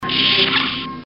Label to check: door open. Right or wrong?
Right